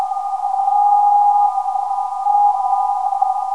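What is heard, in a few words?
TE 331kHz, non-directional beacon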